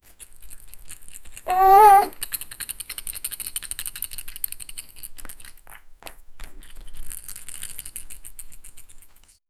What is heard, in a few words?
baby playing with ball